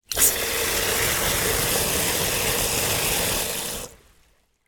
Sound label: household water spray into plastic bucket short fast